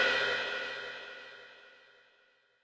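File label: Industrial crash; 300 Drum Machines; Yamaha DTXpress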